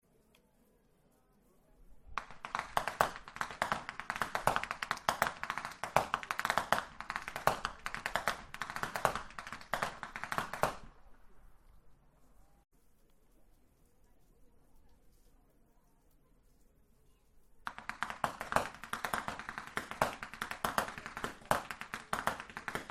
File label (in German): schnalzen; saaldorf